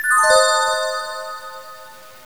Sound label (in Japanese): Trinityのベル系サウンド。ディミニッシュ（ダウン）。; Diminish1d